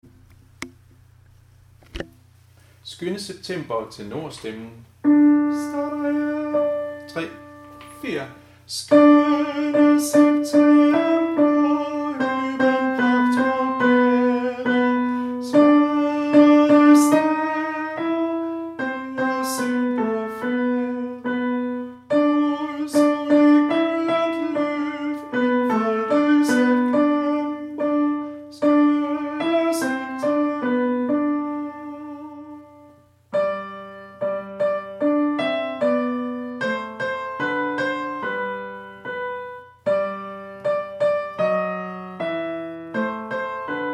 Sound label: tenor; harlev koret